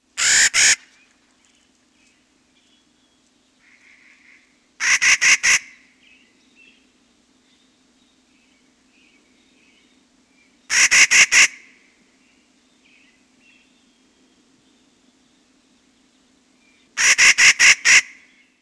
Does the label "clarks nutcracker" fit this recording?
Yes